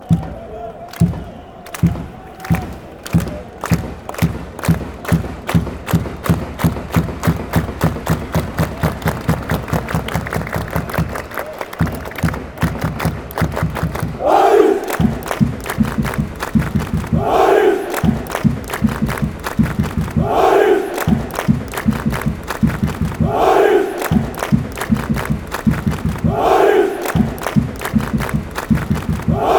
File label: drum